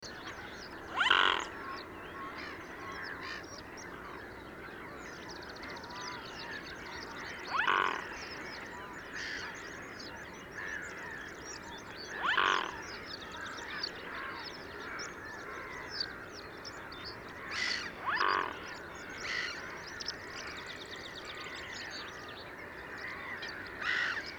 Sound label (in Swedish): Knolsvan